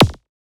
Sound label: Percussive Elements